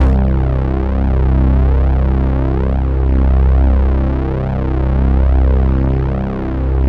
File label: C1 prophet pad; Sound Gallery - Charged